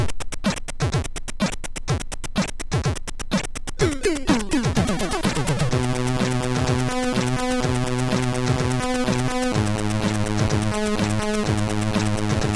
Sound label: sid MOS6581R2; Scarzix 01